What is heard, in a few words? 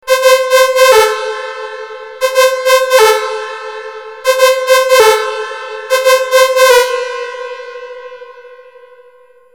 saw hall